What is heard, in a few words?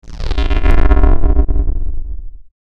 disappear; sfxr